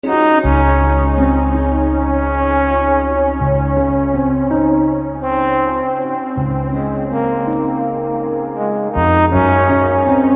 Tamil BGM Ringtone; RRR

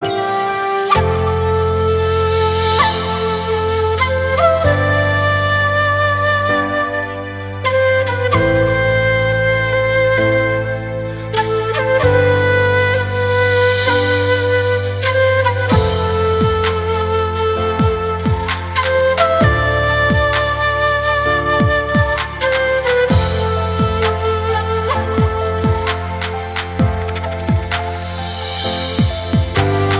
Theme Flute Version; Battlegrounds Mobile India

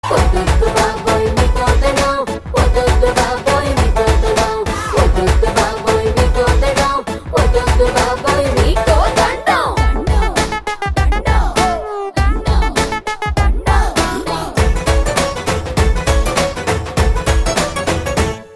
Female Ringtone

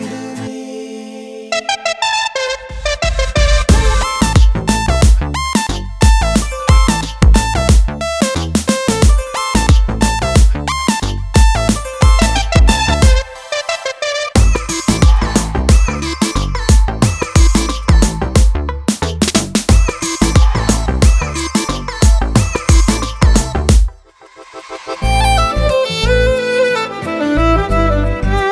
Karaoke

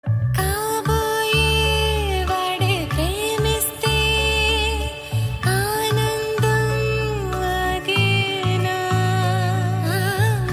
Female Ringtone

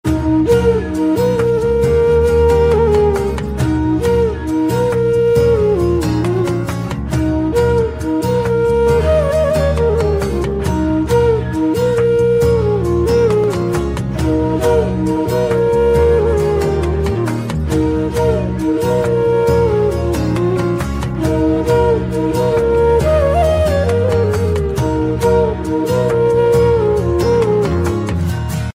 Flute Instrumental Bgm Ringtone

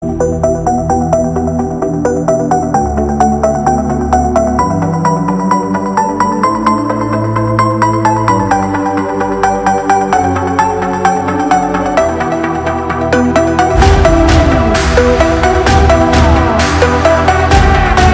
Instrumental BGM

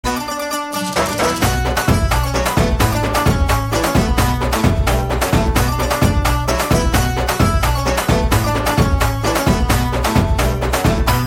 Rhythm BGM

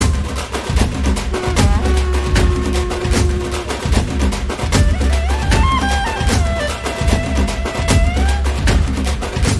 Drum Beats BGM

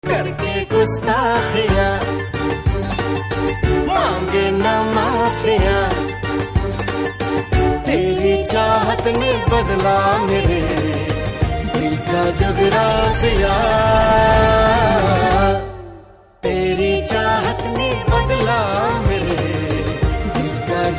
Ringtone

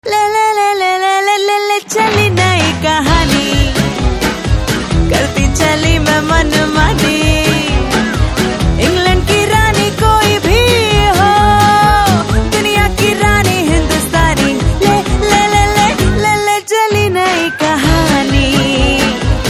Hindustani Ringtone

